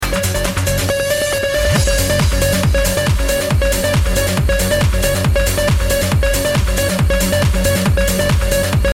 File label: trance